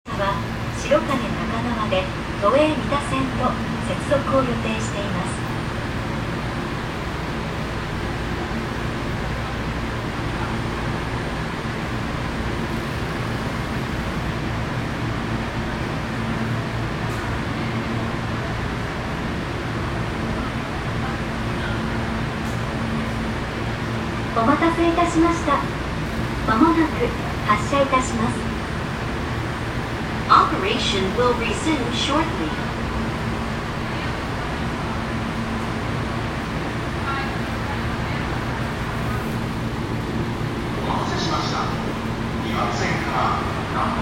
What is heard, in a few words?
train sounds; 南北線目黒北